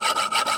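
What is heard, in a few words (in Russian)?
скрежет старой пилы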